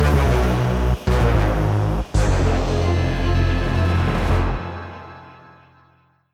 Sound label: Game over stinger; Invader Zim The Doom Game